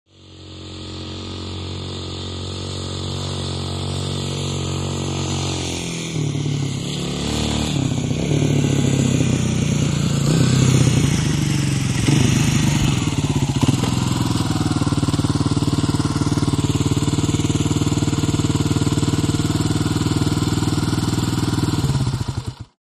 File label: Stop Off Four Wheeled, 4 wheeler